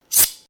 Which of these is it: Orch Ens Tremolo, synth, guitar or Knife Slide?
Knife Slide